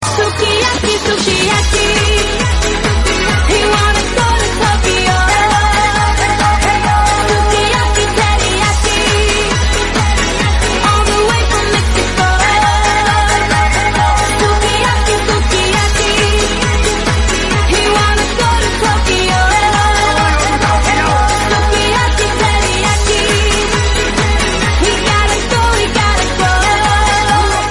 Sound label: English Song Ringtone